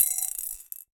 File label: Drop In Glass, Medium Wine Glass